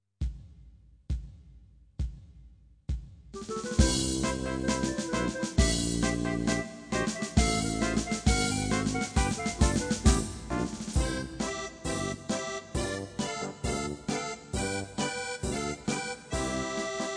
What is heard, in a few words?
Polka